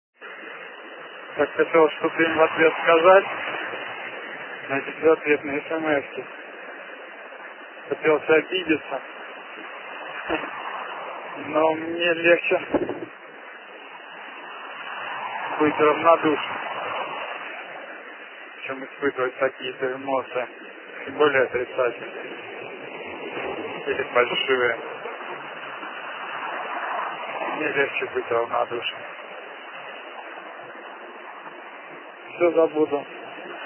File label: sms